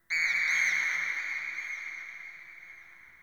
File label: Interior Texture High Pitch Screech; Ambience; Indoor; Warframe; Infested Eris